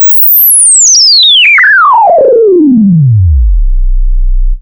HI SWEEP DOWN, BOUNCE SWEEPS; Zero-G Ambient 1

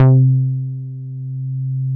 MOOG1 BASS C3

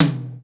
DRY T, drum; Crypton; DrumBase5000

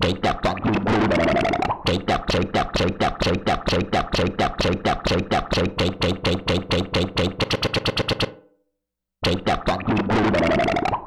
synth FX, Cmin; Big Fish Audio; Hardcore Breakbeat Action; Booty Track 130

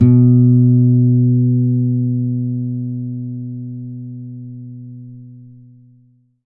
acoustic bass b2; PS-52 WORLD INSTR 2; STRINGED INSTRUMENTS; PS VESTER ACOUSTIC BASS

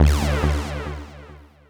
Synth Hit D; Zero-G Trance Inducer; 90 s Sample CDs

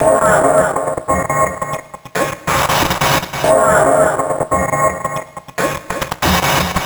Envelope Beat; Drums & Drones

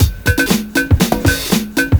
PERCS, 70 s Breakbeats

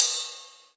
Ride Cymbal

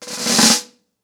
Snare Cres, drums singles; The Roots Drumloops

